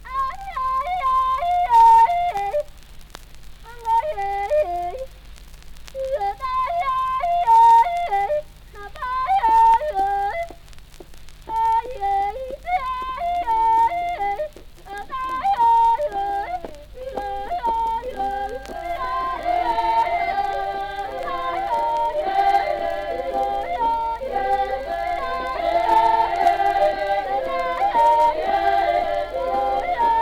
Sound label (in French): chant magique avant la chasse a l elephant; Dongbo So Chant De Societe Secrete